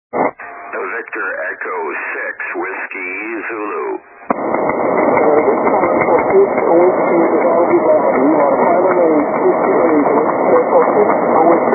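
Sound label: 80m short path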